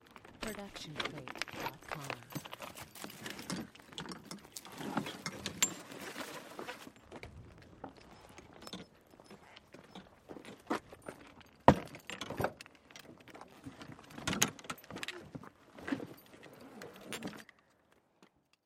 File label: golf bag handing mono